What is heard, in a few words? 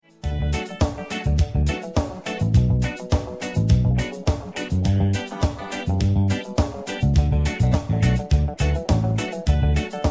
reggae ringtone